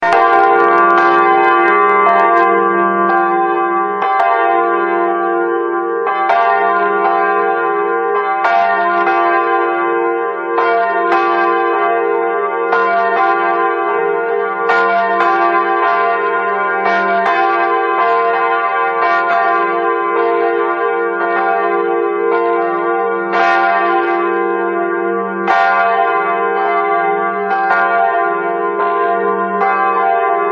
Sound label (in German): friedensglocke ewigkeitsglocke; neues wahrener gelaeut